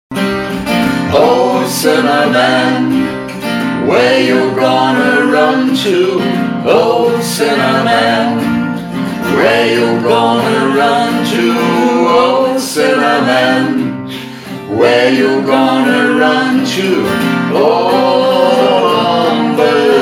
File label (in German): 3 stimmen; sinner man 3 stimmen